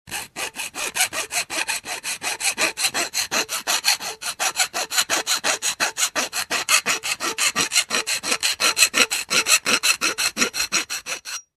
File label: Hand Sawing a Pipe